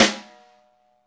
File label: SNR, Naturals; Club-50 - Foundations Roland; Natural 10